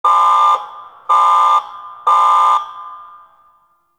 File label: power down alarm; Ambience; Gameplay; Warframe; Corpus Gas City; Gameplay Remaster